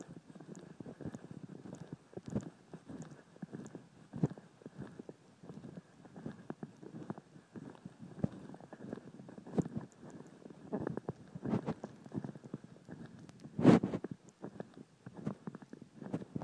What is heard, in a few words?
snow crunch